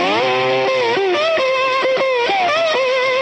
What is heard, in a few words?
fuzzy slide guitar